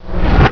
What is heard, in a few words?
pots